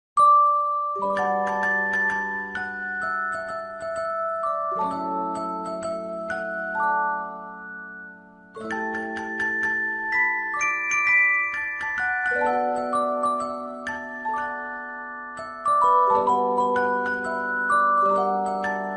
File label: music box ver.